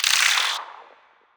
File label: damage; Barotrauma; Spineling